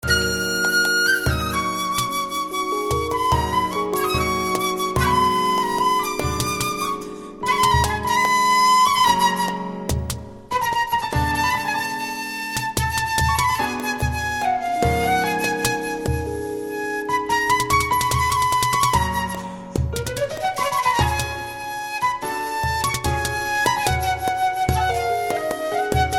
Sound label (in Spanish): Alegri tercer, flamenco flute